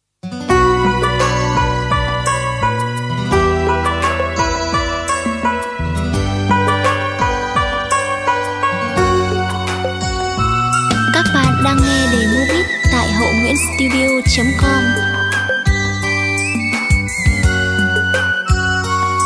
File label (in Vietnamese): Dan ao mua xuan beat